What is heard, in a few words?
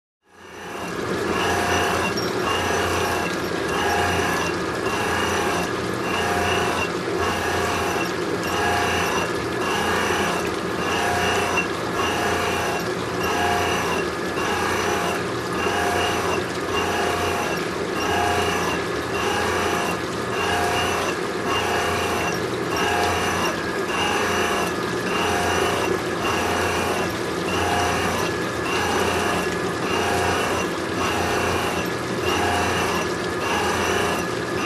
Heavy logging machinery